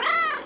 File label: cat shorty